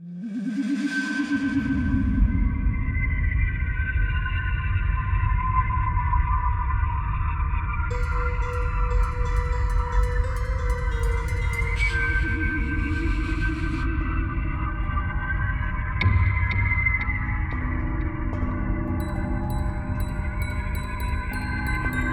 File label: Suspenseful Background; Detective Grimoire Original Soundtrack